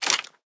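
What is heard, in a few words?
inventory items ammo; STALKER; IWP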